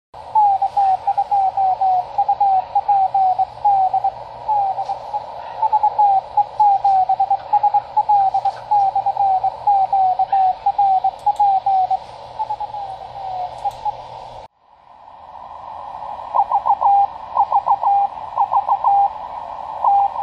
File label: chirp samples, cw and transmitters; CW Learning Programs